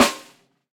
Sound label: shiny steel snare drum sound a sharp key